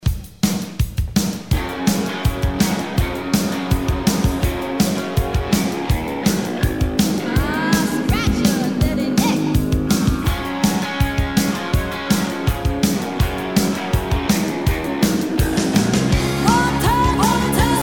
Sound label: bass stop break